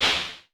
CLAP, Vermona Mono15 Drums

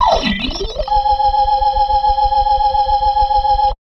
B3 RISE G#, PLAYED

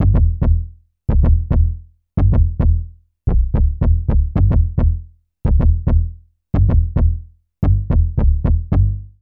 BASS LP

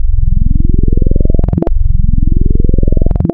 Add Partials Range All, wavetables Additive; Architecture 32

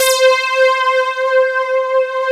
TECHNO; Pro-Rec - Synthsonic; Z1 TECHNO

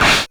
Drums, Hits; DCM8

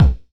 Punch Middy, Kicks; Airwindows

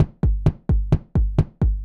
130 trance break, Drum & Perc Loops

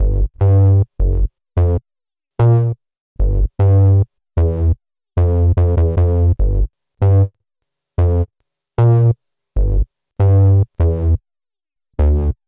Classic Bass, Drum N Bass; Sonic Foundry (Sony Creative Software); Knutrix Drum & Bass

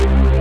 RAVE, RAVESYN

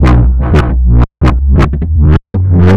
173 Bass, Drum n Bass

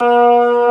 B3 C 2, organ; VOCAL ORGAN

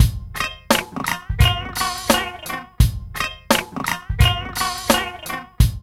LOOP

